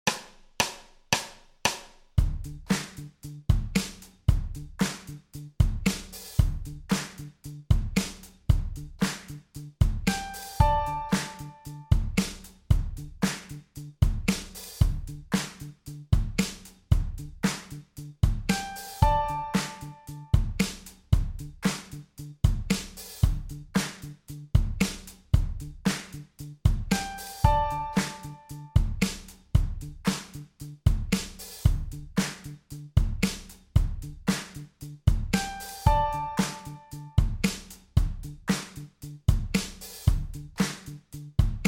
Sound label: BPM 114 In D, song jams